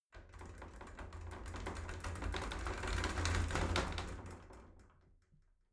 shower slide door rattle short

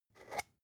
spice jar scratch tonal open slow; industrie wirtschaft; gastronomie

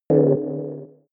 bing delay low; kommunikation; signale

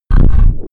alienated rocky impact; hits explosionen

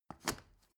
step ladder wood scrape hard single; schritte

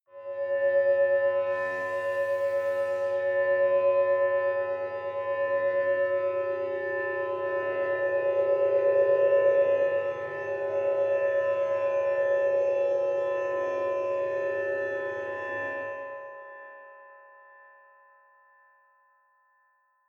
tibetan temple, pads; flaechen

